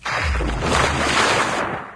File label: underwater asterisk; windows 9x; sound schemes